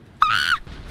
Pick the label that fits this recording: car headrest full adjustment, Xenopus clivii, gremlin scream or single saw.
gremlin scream